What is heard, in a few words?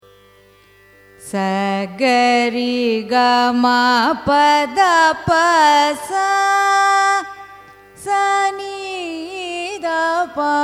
raga begada arohanam avarohanam; carnatic music